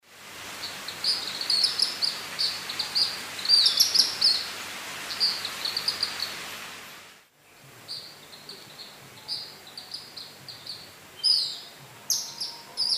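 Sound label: Panyptila cayennensis, calls in nest playback; S. America; Eastern Lowlands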